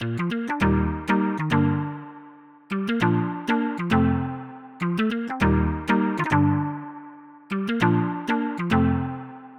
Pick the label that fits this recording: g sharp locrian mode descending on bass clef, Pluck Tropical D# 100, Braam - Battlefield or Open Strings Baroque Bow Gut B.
Pluck Tropical D# 100